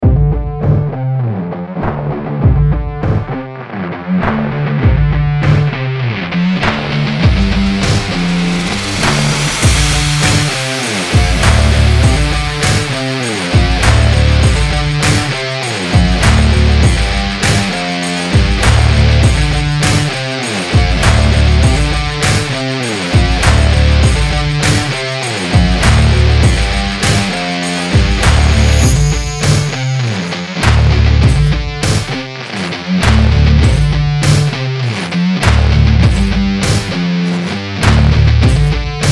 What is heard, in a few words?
stock music